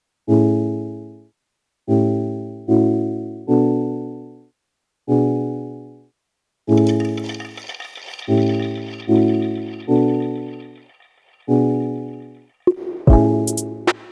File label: Bubble, EDM Pop